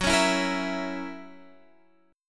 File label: F sharp 7th, Guitar, Drop D